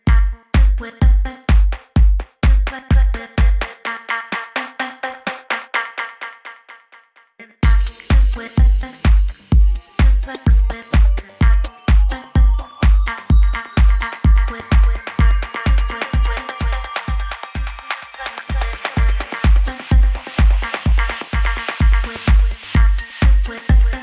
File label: Delays